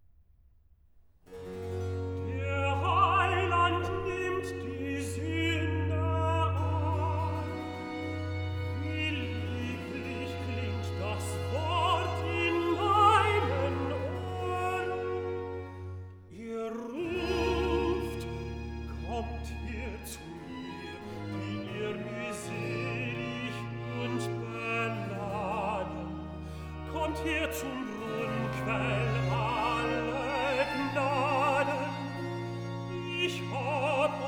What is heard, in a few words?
Recitative (tenor); Cantata BWV 113; Der Heiland nimmt die Sünder an; Johann Sebastian Bach; Gardiner